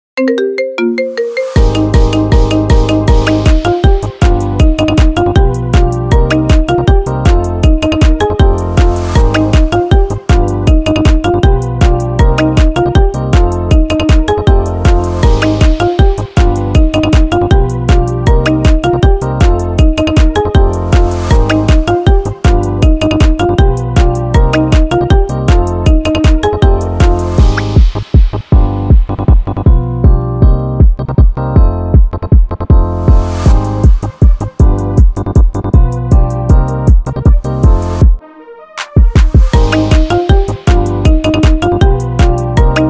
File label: Marimba Ringtone